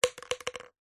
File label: leisure toy marble drop into plastic container